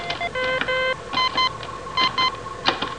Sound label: Beep